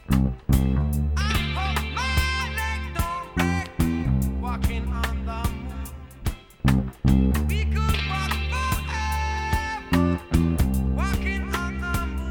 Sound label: L-1500 Neck only Single Coil Passive; Tona 90